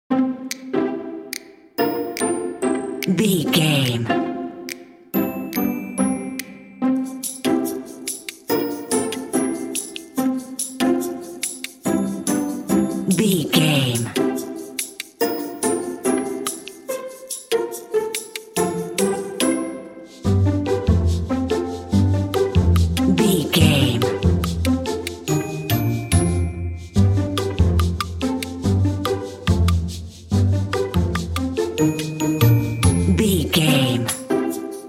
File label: hap hap clappy background only